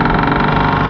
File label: Metal Door; IQ2 Demo